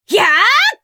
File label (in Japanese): 攻撃; ダリア